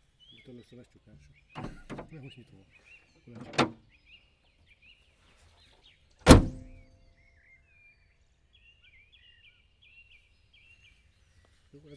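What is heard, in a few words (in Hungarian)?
motorháztető automatikus kinyitása kézzel való lecsukása; vízház; autó opel; cardioid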